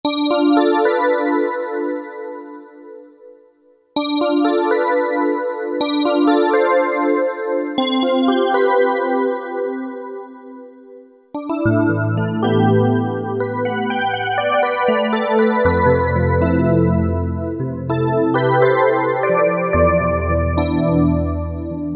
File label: E. PNO; Akai SG01v